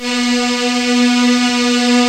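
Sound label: synclavier pad; Rubber Chicken Software Sample Bank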